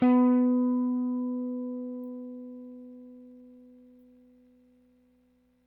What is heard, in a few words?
Rickenbacker Bass; Airwindows